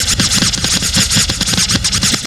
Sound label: SCRATCH FX R; Big Beat Samples & More; AKAI; OTHERFX KIT